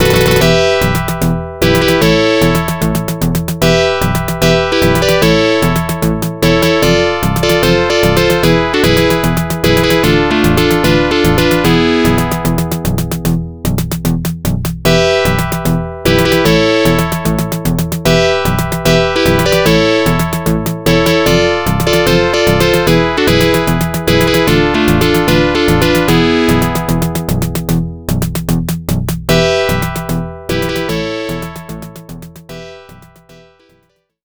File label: arcade background music; commando